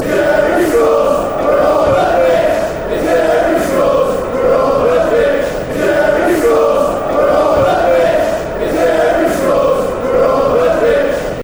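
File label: cherry scores, football chants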